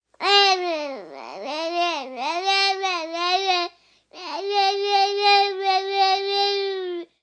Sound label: Baby Babbling, Baby sound, 1 yr., babble, long, human voice, kid, child, infant; Talking Sound Effects; Sound Effects:Voice; VoiceBox